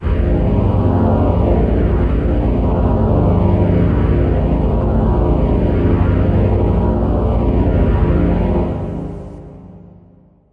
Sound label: rumble string, Synth